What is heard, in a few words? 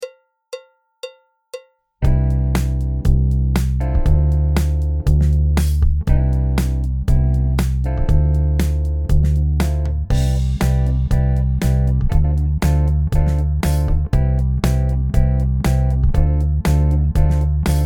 Two Finger Groove